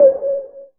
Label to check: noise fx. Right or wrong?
Right